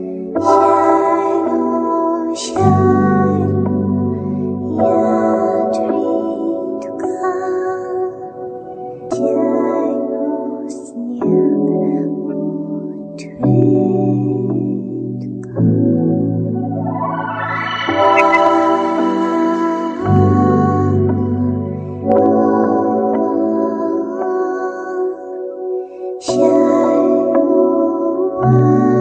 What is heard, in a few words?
Lullaby